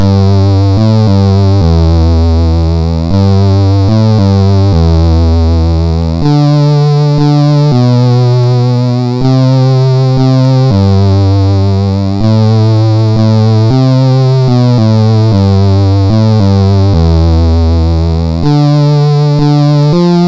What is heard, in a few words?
Xmas Song, sid MOS6581R3; hvsc